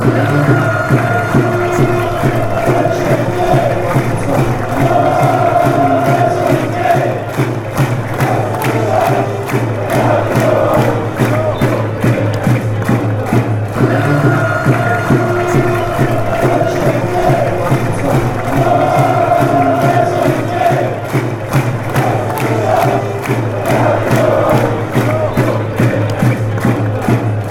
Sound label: fan chants; heskey wonderland